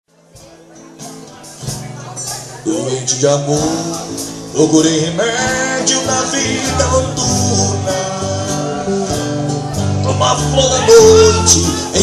trio

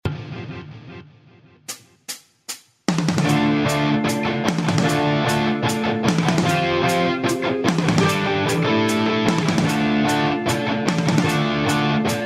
guitar, new music

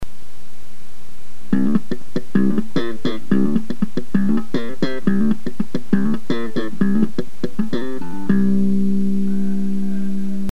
slap

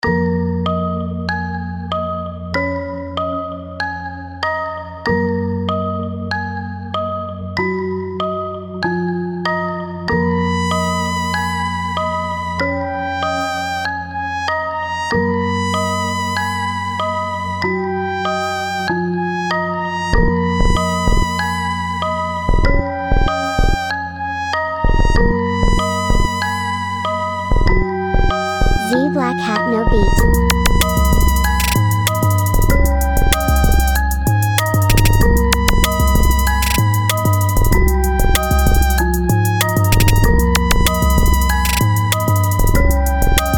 detroid type beat

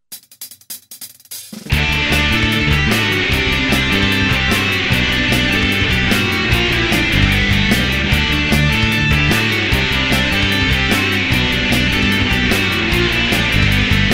the earth and the sky instrumental

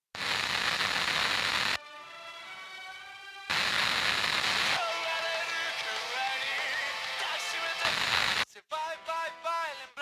badass rock n roll

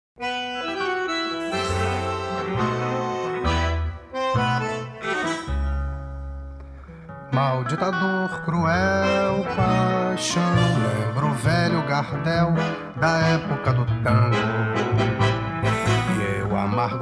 bandoneon

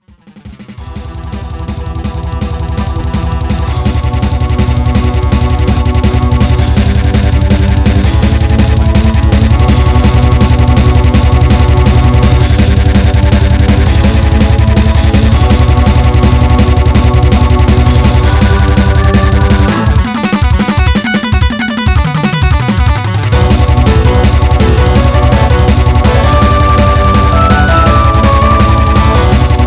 midi; synphony

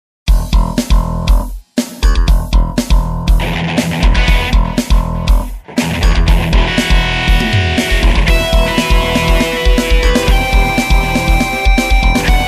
my three chords instrumental

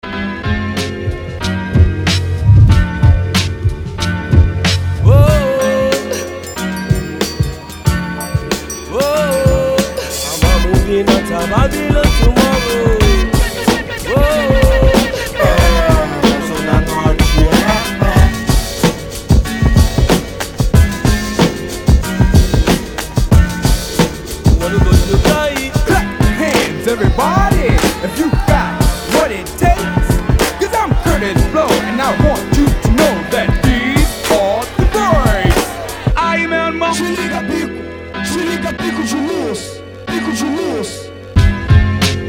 instrumental

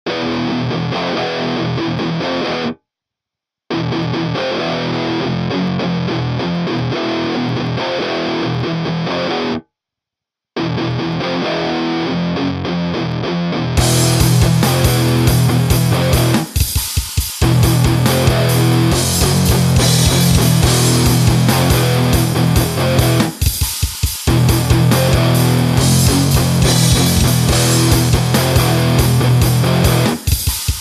ny hardcore

